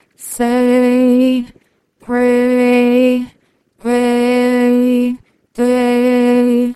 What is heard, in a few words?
singing